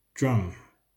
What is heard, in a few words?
drum; how many toys